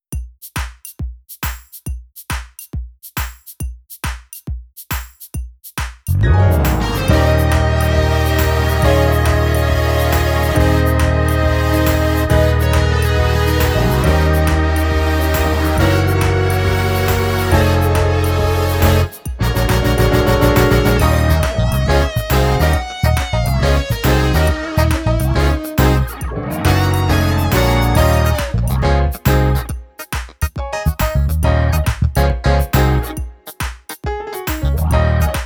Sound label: drumless